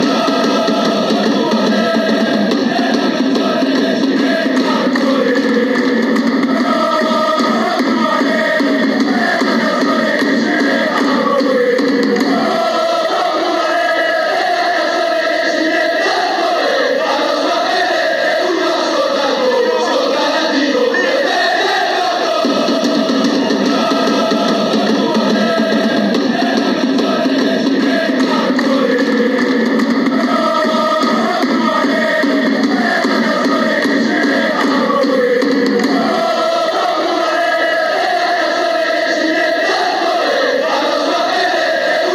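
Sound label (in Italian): rossoblu ale, football chants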